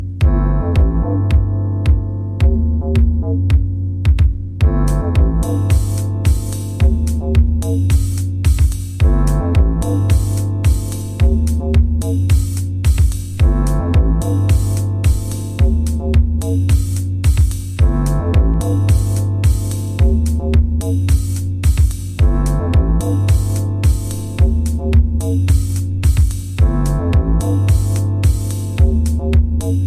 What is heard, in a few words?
DOWNBEAT